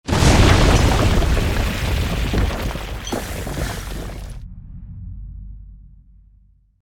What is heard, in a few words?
Large explosion with debris falling rocks